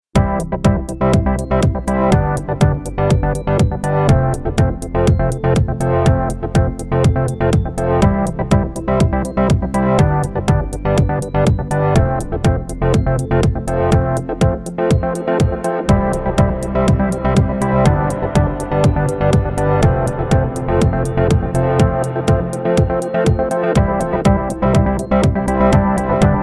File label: Soft dirty FM; Montage